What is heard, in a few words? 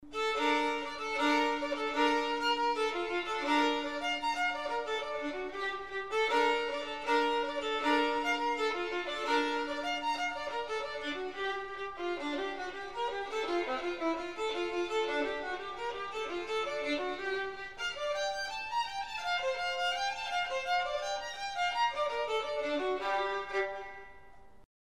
Wedding Ring, solo violin; celtic wedding music